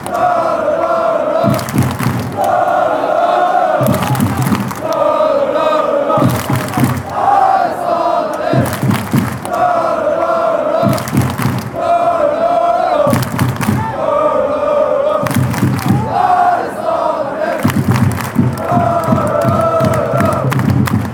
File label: lo lo lo lo lo standard liege, fanchants